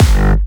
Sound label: Jump Kicks, Bassdrums